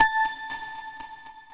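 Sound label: Electric Piano Hit; Keys; Keyboards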